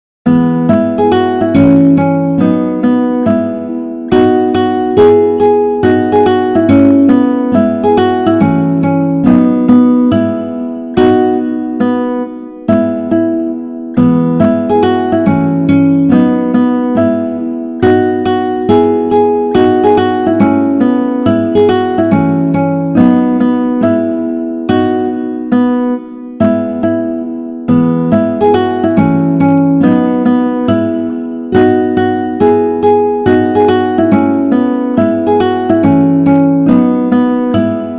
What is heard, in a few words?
guitar; Baby Bumblebee